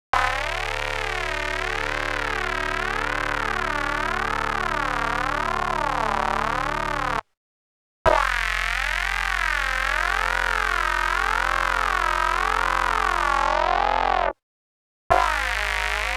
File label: CS80 Multi Synth D+3; Klaus Schulze Collection